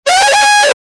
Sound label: ta da triumphant